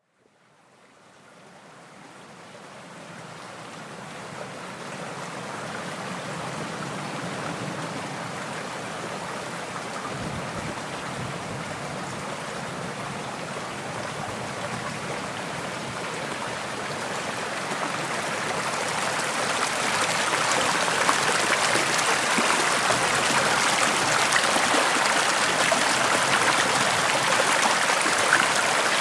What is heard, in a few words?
Estuary Soundscape